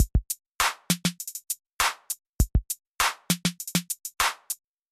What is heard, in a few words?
Applying Transient Master, latin trap; 如何制作拉丁陷阱音乐; 一个Transient Master。将其Attack启动时间设为+4，其Sustain持续时间设为-59，可使打击乐部分更具侵略性和紧实感。